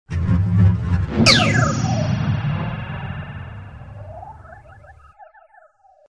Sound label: jump to lightspeed; star wars; green screen effects